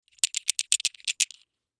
Dominos Shake In Hands; Board Games; Sports; Blastwave

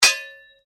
Blade Heavy Moving Impact, Sword; Foley sounds for melee weapons; Swords (Sound Effects:Combat)

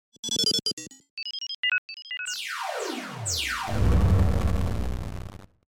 Digital Distortion Left To Right

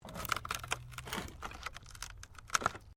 WOOD PLANK TWIST

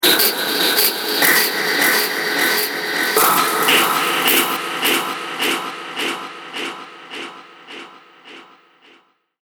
Dark Horror Hits; Miscellaneous Impact Sound Effects; Impact / Explosion Sound Effect

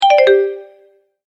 multimedia tone alert

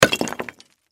Crash Rock Drop Cement Debris; Rocks; Sound Effects:Materials; Blastwave

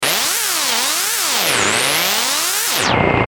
Tuner Distorted Sweeper; Communication

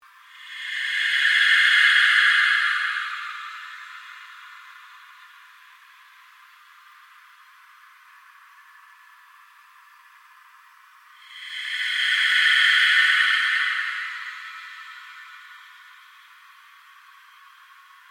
Ambient Drone Slow Sweep

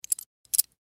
Butterfly Knife Handle Movement; Warfare; Knives (Sound Effects:Combat); Blastwave

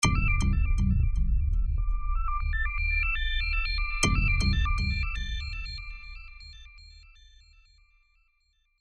Synth Stabs Arpeggio; Musical; Blastwave

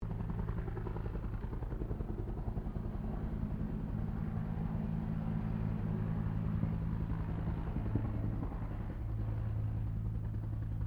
HELICOPTER BELL UH1 IROQUIOS PASS LONG; RAN UH-1 Iroguios; Helicopters (Sound Effects:Vehicles)

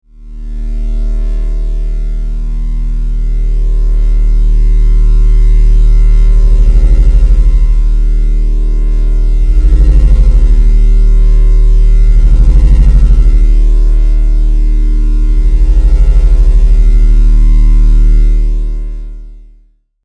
Electrical, Hum, Scifi; Waver; 5.1; Sound Effects:Science Fiction; BlastWave

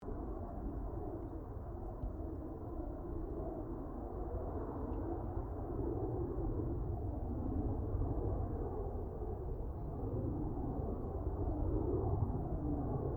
AIRCRAFT GLOSTER METEOR F MK8 PASS DISTANT; Gloster Meteor figter jet; Airplanes

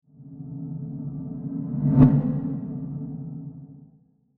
Spooky Ghost Transition; Scary Soundscapes; Horror

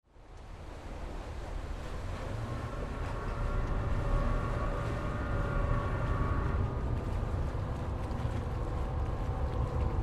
Ferry Pull Into Dock; Boats (Sound Effects:Vehicles)

